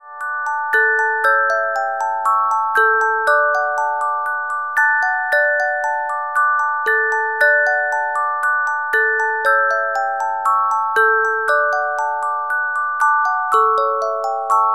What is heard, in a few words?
Carillon Music Box Melodies